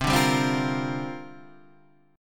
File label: C 7th Suspended 2nd Suspended 4th C